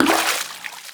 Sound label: pull splash; Fate